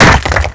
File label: rock fall break; Call of Duty Modern Warfare